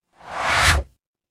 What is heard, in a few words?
ui swipe